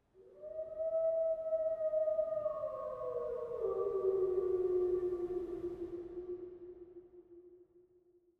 long wolf; ambient

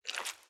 slime walk; blocks; Minecraft; Remastered

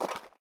mud walk, step

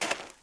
snow walk, step; Minecraft; Remastered

